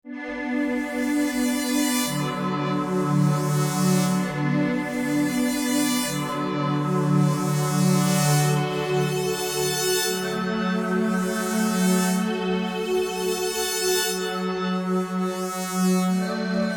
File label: Bm 120 Korg chords